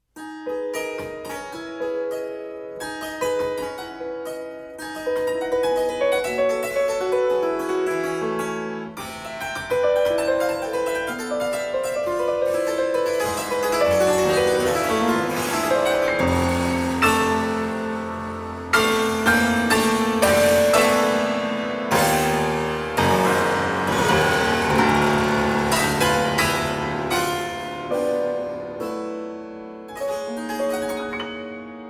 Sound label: interlude (altered piano)